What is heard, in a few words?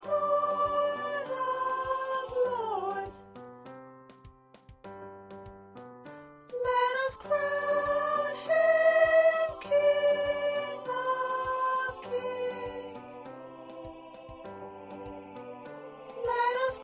Soprano, choir